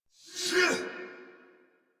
Attack Effort; Warframe; Dax Ashigaru; en

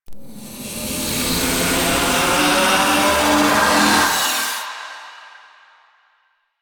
Absorb Loop; Warframe; Stalker

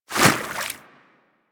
Infested Small Walk Water, Footsteps; Warframe

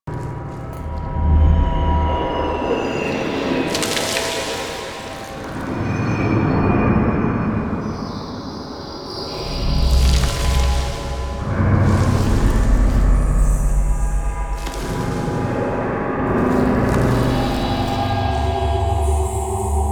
Interior Orokin Loop, Ambience; Warframe; Infested Eris

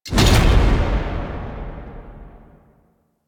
Fist Slam; Ambience; Death Thrax; Duviri; Warframe

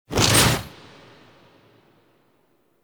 Scythe Pull; Stalker Scythe Pull B; Warframe; Quests; The New War; Sealab; Hunhows Lair; Hunhows Lair Part One

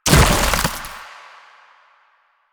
Infested Move Turn; Warframe

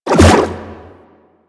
Aqua Board Spin; Warframe; Yareli

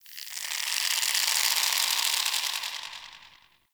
Under City Rope Creak; Ambience; Dungeons; One Offs

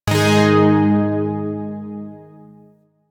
Shawzin Chromatic Chord; Zariman Shawzin

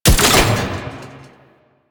Sniper Alt Fire Mech; DE; Warframe; Frumentarius; Exalted Sniper

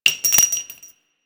Shell, Weapons; Dual Vipers

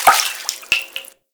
Hut Interior Drinking Well Drips; Midrath Island

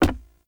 unarmed punch impact wood hollow large; Weapon Impacts; Player; Environment

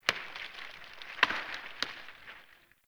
Day Branch Crack Small; Glades Of Andurin

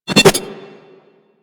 Tonfa Parry Whoosh; Warframe; Tenno

